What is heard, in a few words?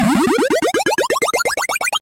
power up